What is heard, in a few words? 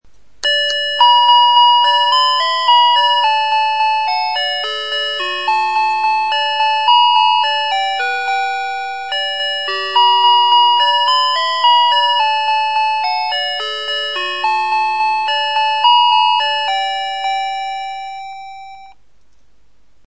melodies, electric